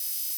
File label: Menu Zoom; Menu FX